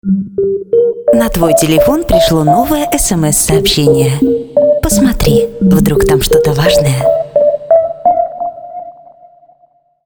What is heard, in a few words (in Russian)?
СМС рингтон